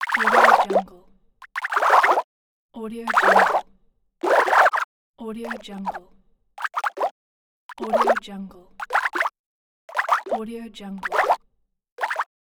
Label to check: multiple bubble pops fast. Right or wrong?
Right